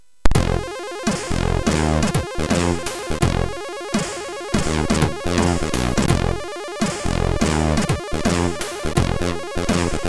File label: Funky, sid MOS6581R3